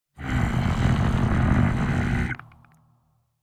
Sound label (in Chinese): 愤怒声; 监守者